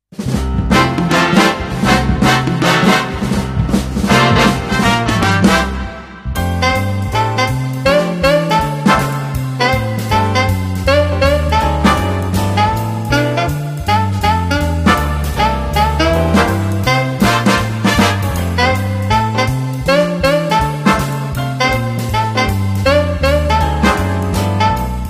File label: shuffle